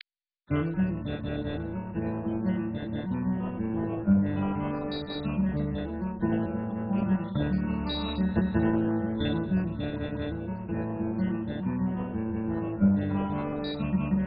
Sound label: jig